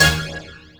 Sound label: 1 SHOT, Laser Tag, Hip Hop; E-MU Formula 4000 Series Vol. 1; Hip Hop Nation; Laser Tag 100